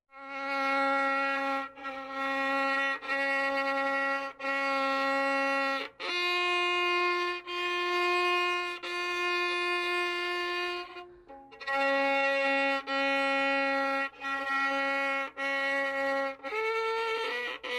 Zvuk skripki nachinauchego muzykanta

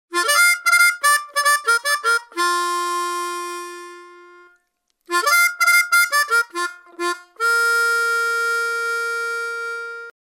signaly pobudki na gubnoj garmoshke

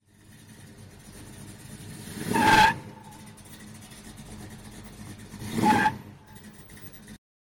tri varianta prokrutki koles

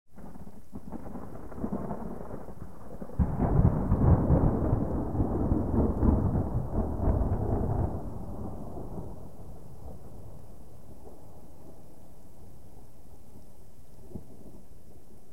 morojashhij s gromkim rokotom

